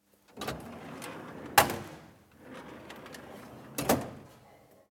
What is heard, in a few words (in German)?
Schublade